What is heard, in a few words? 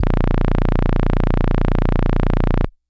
Hard Brass DX100 As0